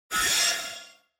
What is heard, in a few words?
Cyber